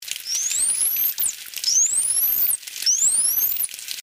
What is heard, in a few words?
SW, dolphins signatures; Lemon